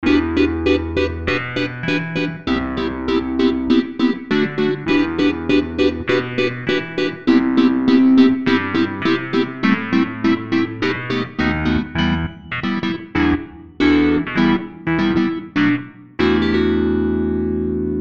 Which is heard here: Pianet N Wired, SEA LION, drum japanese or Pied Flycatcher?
Pianet N Wired